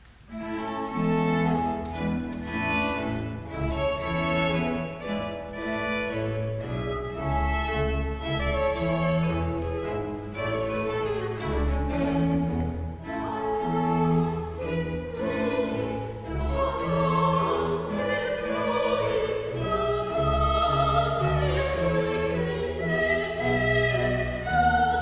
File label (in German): Duet, Das Land wir ruhig; Psalm of Joy - VII. Duet (Das Land wir ruhig)